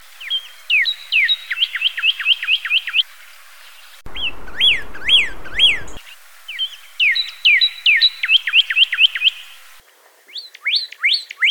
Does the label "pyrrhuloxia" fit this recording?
Yes